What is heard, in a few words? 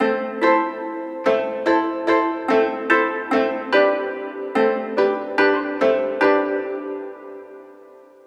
Koto, Am, 145bpm; Little Ethnic Vol.1